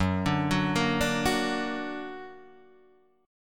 Gb Augmented Guitar Standard; 2,5,4,3,3,2; Gb+ arpeggiated

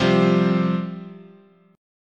C Suspended 4th, guitar chord

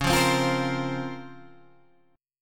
Db Major 13th, Guitar Standard; strummed; JGuitar

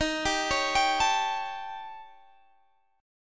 Eb 7th Sharp 11th Eb; arpeggiated

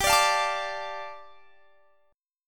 G 7th Suspended 2nd G; G7sus2 strummed

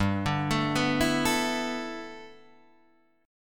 G 6th Add 9th, Guitar, Open D

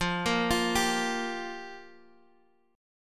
G Minor 7th F

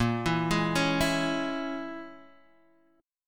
A sharp Minor 7th Flat 5th A sharp; arpeggiated; x,1,2,1,2,0

